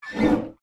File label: Swan Giant Breaths; the unfinished swan